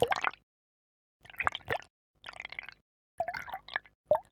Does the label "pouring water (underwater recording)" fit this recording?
Yes